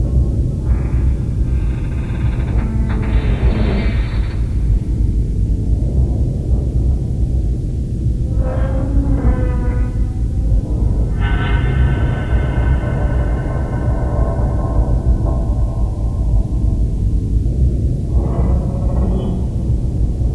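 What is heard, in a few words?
ambience rumble creak; hydrophobia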